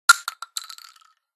spread casing; spreadgun

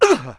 land

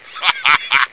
laugh, male

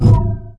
pickup

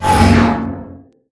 refresh use

